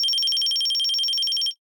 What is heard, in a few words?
alarm, alarms; freetel